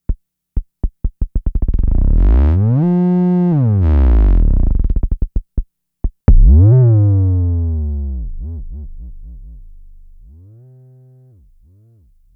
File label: Fricke Schlagzwerg FX